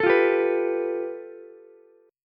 A 7th Suspended 2nd Sharp 5th, Ukulele; A7sus2#5 strummed; JGuitar

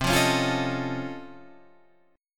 Db Augmented Major 9th, C

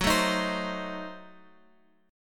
F sharp Diminished 7th Guitar Open F; strummed; JGuitar

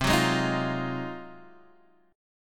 G sharp 13th C

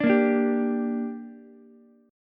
G Minor G down Ukulele, chord; strummed; JGuitar

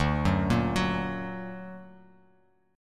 D Augmented D down, Bass Standard; D+ arpeggiated; JGuitar

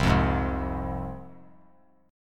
C Suspended 4th Sharp 5th, C, down, chord; Csus4#5 strummed; JGuitar

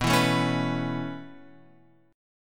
B 9th Suspended 4th B down Guitar Open F; B9sus4; strummed; JGuitar

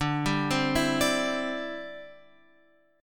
D 7th Suspended 2nd Suspended 4th, Guitar Open F; arpeggiated; x,9,7,7,7,9; chord

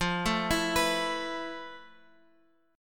F Major Sharp 11th, Guitar Open F; arpeggiated; JGuitar

